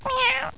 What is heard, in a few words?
KITTY; shogo